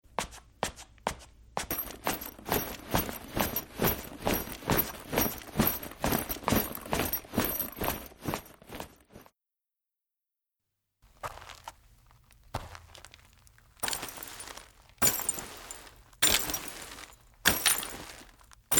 Footsteps Clothes Props

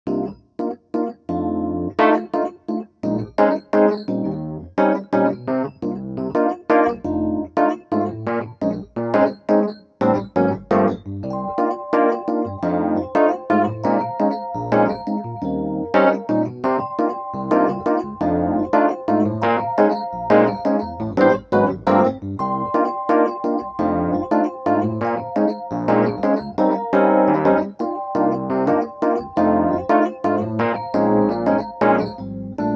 Staccato Chords; NKS

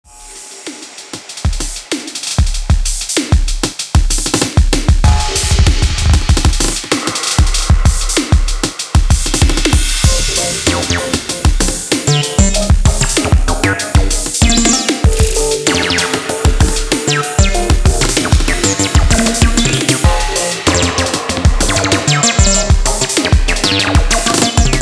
Acid Jumper

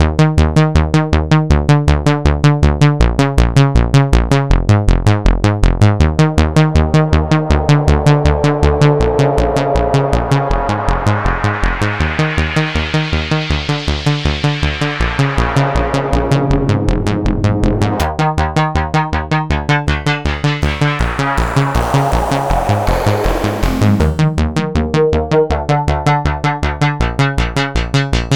iceverb lead; maschine mikro

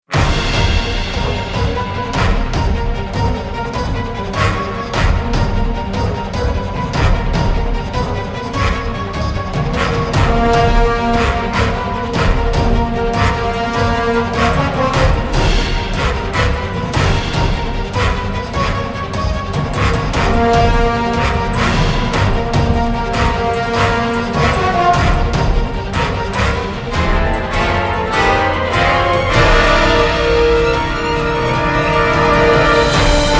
Brass; CineBrass PRO; Cinesamples; Kontakt

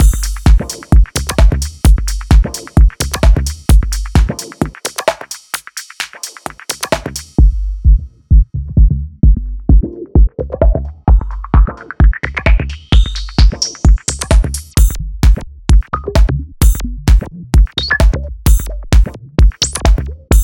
Filter; MASCHINE STUDIO

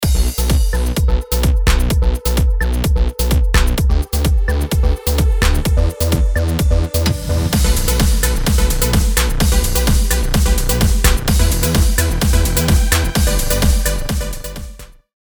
Hoover; iMaschine Expansions

